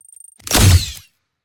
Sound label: weapon gun gunshot futuristic; Sonniss GDC